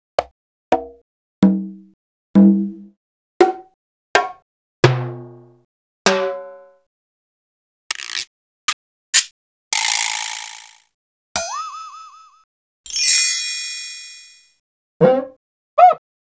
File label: R8 Mixed Perc; drums&percs (chains); X-Static Goldmine